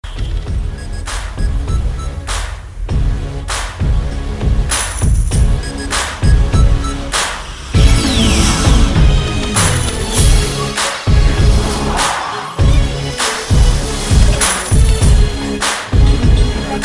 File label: Full Bass Clapsssss